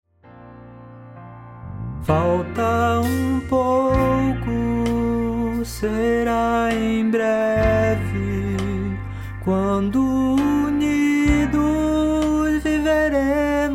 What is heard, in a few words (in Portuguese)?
BARITONO